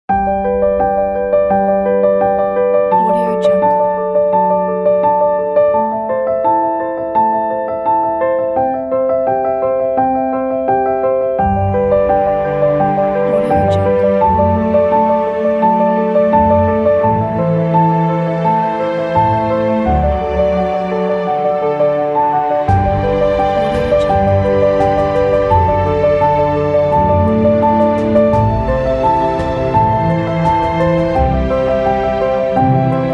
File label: piano inspiration; melodrama studio